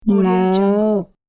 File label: cow moo cartoon